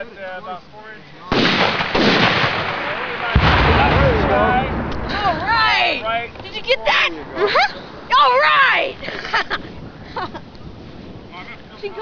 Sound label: hit